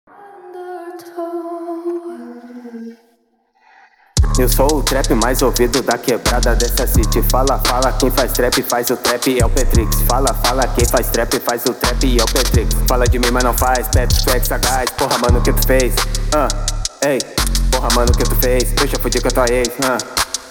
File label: trap